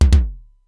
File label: Simmons SDS-9 drum machine; Factory C5